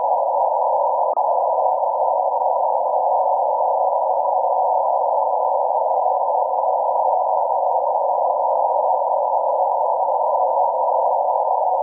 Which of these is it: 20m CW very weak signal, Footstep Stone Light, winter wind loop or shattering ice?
20m CW very weak signal